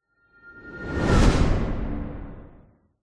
sound design cinematic whoosh into slam impact dark